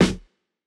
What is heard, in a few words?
Jazz hop snare